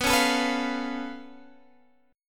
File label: C sharp Augmented 7th B